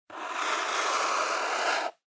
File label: Felt Tip Pen Straight